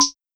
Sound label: Conga Hi, Korg SR-120